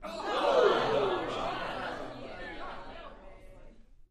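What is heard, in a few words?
Crowd 15 Interior Rowdy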